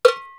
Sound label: dim cowbell single shot studio; percussion; single hits one shots